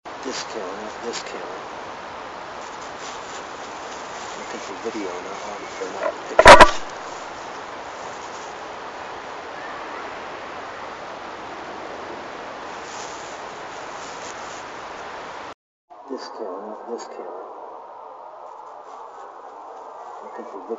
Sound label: whistle